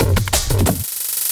LOOP, Drum & Bass, 180 BPM

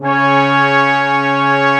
BRASS C4; AKAI S6000 CD-ROM - Ultimate

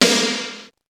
SNR; Club-50 - Foundations Roland; Cheez Snrs

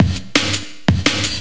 loop, Drum & Bass, 170 BPM

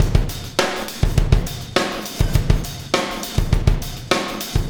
Beat, Loops; Sonic Foundry; Not Just Another Pretty Bass; AI Loops - Siggi Baldursson

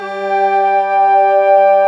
G2 XIANG REEDS; NorthStar - The Wizard VOL-2

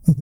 CUICA MAN; Spectrasonics Vocal Planet

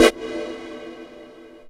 CMI Hot Air A3; Proteus 2000; Digital Sound Factory Proteus 2000

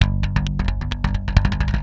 Brush Fill, Industrial; Nasty

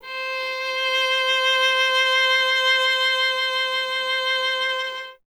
viola C; Miroslav Vitous; Solo Instruments VOL-1

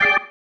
Stab One Shots, Organ; Sonic Foundry (Sony Creative Software); Joe Vitale Organ Donor

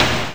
TURBO SNARE

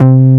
BASS, JV-1080; Syntec; Wall of Sounds

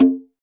Pandiero Open; Roland BK-5; Percussion

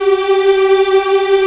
VOX AFMM, VOX Afro Mms, Africa

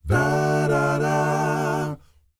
Accapella, Doo Wopper, Blues & Soul

